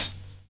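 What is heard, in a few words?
PHASE cymbal; Univers Sons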